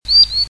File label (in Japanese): kawasemi